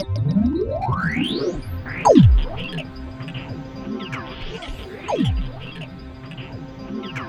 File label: BASS, SynthLine; 90 s Sample CDs; NiceBeats; SynthLine VOL-2